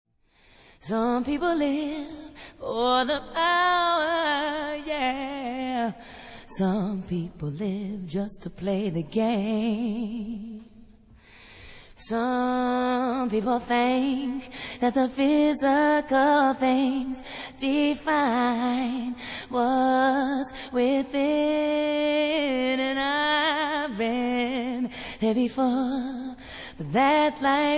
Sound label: acappella